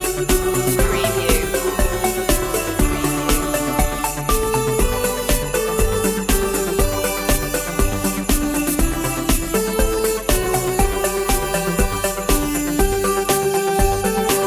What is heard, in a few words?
merry christmas synthified, Synth based instrumental version; traditional